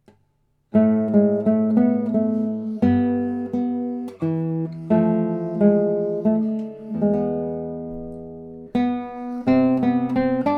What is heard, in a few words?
Minuet; H. Purcell